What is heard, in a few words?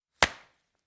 slap with glove